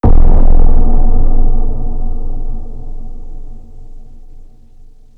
Bass

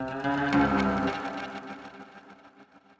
ethno, 160, C

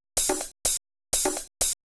HOUSE BEATS 125

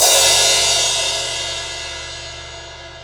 Crash; Drum Kit 02

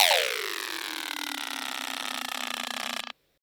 Industrial 140

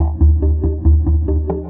PERCLOOP TEKNO 140; Techno2

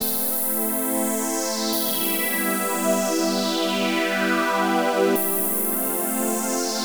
TECHNO PAD 140; Keys

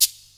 SHAKER

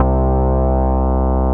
BASS MINIMOO

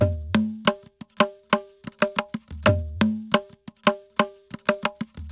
ETHNO PERCUSSION 90; ethno2

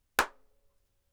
Snares & Claps, One Shots

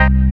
ORG, DANCE ORGAN

